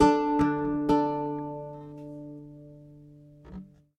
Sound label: Lute Performance; Lute Anim Performance 10